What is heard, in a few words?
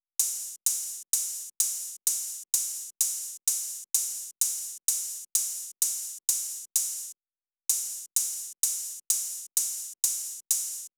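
128BPM Open Hi-Hat; Demo Constr Kits; Early Morning Kit Root G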